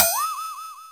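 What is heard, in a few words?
R8 percussion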